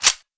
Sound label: slide forward; trh 92fs